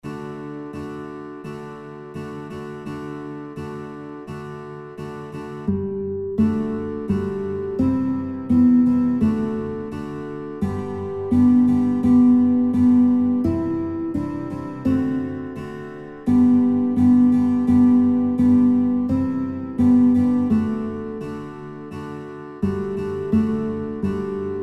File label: Guitar; Guitar Songbook; Der Mond Ist Aufgegangen; kinderlieder gitarre playalongs